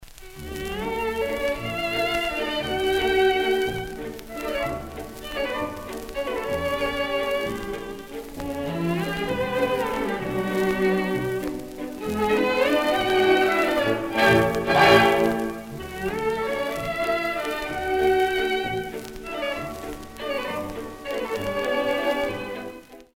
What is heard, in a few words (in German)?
Schellack vorher